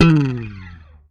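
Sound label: slap, bass; Xsample VOL-1; SLAP+THUMB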